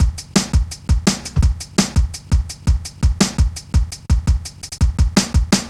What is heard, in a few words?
Step Ez, Drum Loops, Jungle, 168.5